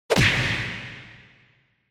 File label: Hit Punch, High Reverb